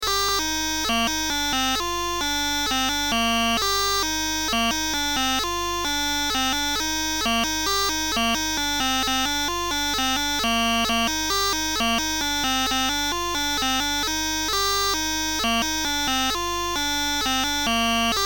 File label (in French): polka; Polka jeu (suite); Breizh